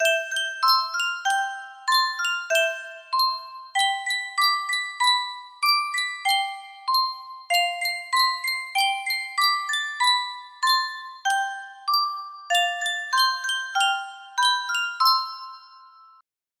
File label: music box